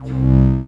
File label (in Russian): knopka cifrovoi odinochnii svistyashii